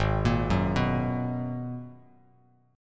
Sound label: Ab Minor 9th down Bass Standard; arpeggiated; JGuitar